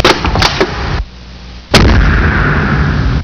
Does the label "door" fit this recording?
Yes